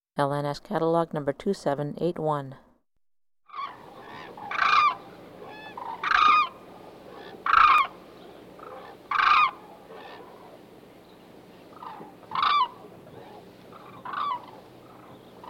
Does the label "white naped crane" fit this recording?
Yes